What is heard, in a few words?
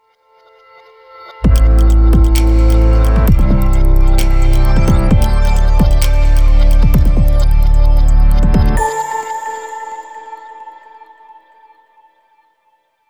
Delightful promising welcome warm inviting bass and groove magical; Artlist Original LOGOs; reversed